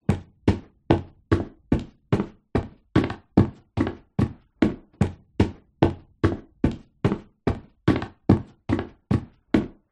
footsteps boots wooden floor boards internal house walking; foley; zapsplat